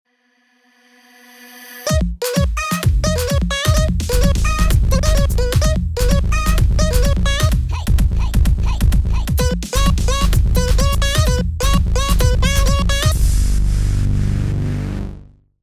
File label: Music sample